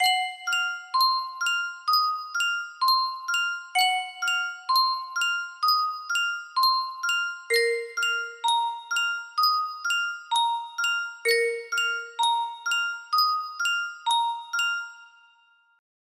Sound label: yunsheng music box, melody; kingdom hearts